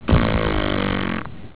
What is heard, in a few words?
raspberry, bronx cheer